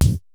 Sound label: ECHO KIT2; Rubber Chicken Software Sample Bank; DRUMS + PERC